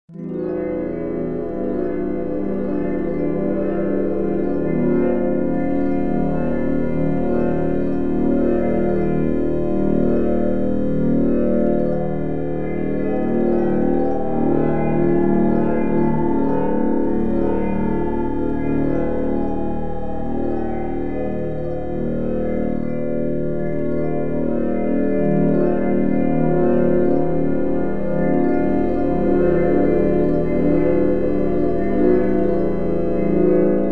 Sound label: Slow Dance